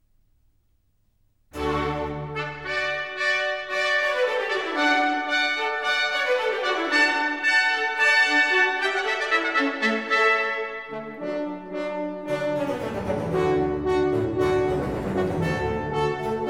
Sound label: Suite in D major, Allegro; Water Music Suite No.2; Handel Masterworks; Klassika